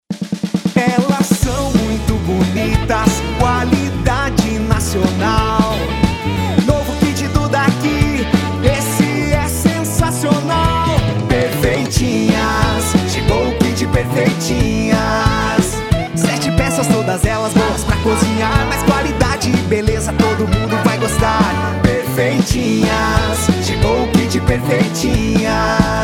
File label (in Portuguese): Jingle